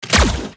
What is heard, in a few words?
Attack